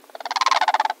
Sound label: Blue Crane Sms